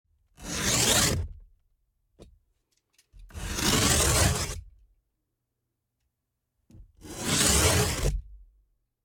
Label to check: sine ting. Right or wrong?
Wrong